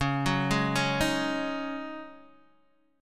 C sharp Suspended 2nd Suspended 4th, C sharp, Guitar, DADGAD